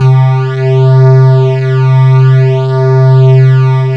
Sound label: Analog, Synthesizers; Soli-Music; World of Synthesizers VOL-1